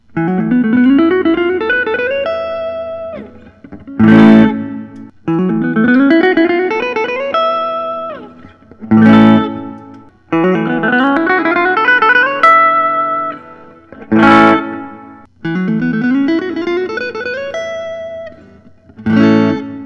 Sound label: prs mccarty 594 10 top; faded